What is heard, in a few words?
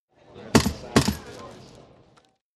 Stamp Ticket Passpor, airport